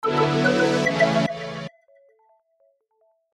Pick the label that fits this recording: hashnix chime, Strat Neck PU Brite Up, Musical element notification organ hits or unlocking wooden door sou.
hashnix chime